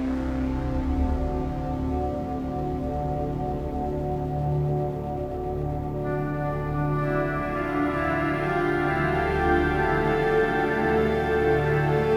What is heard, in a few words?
Dreamy Game Intro, fantasy